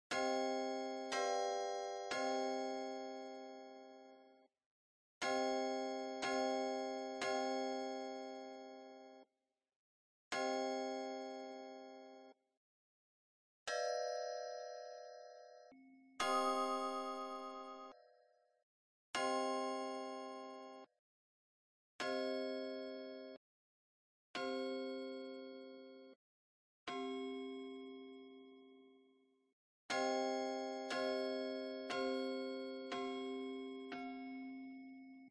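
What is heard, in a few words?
handbell chords; Passion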